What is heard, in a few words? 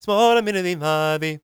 GAELIC, World Voices; ILIO; Vocal Planet